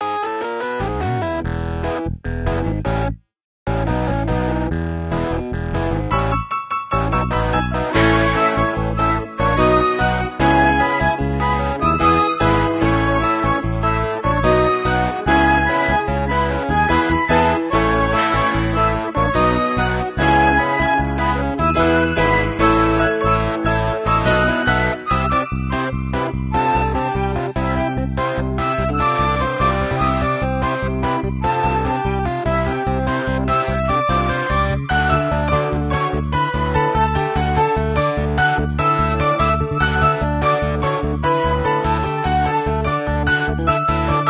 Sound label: Menu Stripped; Delicious Emily's Taste of Fame